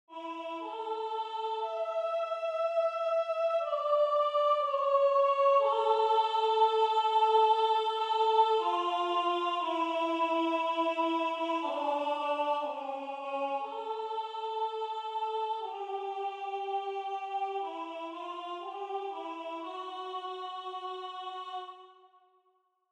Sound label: contralto